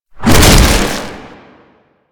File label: Infested Ancient Small Arm Swing; Enemies; Warframe